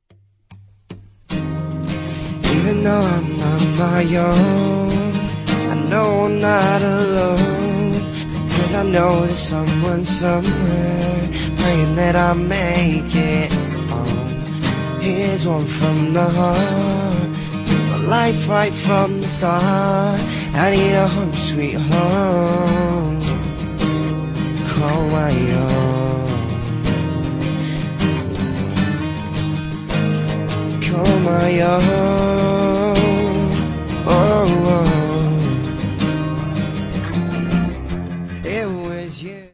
acoustic

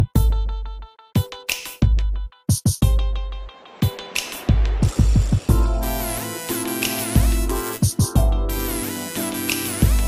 sexy r and b

